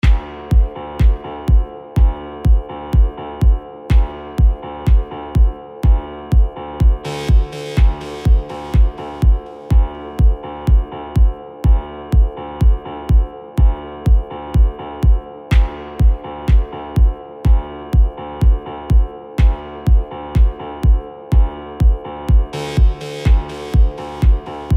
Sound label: Broken Beat